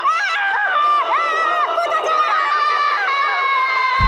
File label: screaming; minions